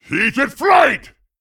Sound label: Male Vrykul Attack